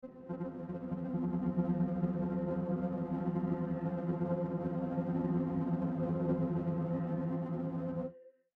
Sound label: Pad